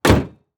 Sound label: Auto Police Car Close Hood; Transportation